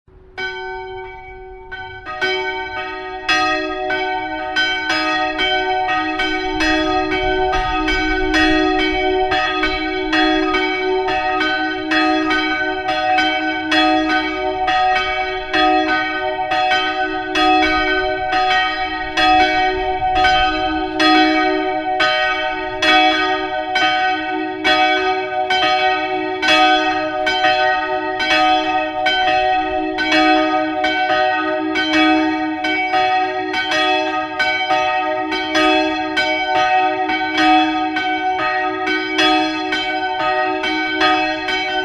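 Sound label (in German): Tonaufnahme es2 ges2, mehrstimmige Geläute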